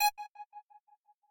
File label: synth